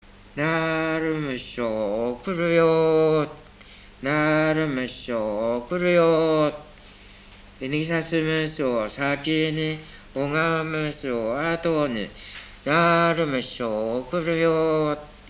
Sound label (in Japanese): warabeuta